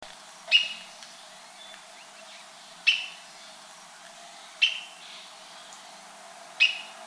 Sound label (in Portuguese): lavadeira mascarada canto